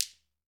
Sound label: GHANA, African Perc; E-MU Formula 4000 Series Vol. 4; Earth Tones